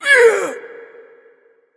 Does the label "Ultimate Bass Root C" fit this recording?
No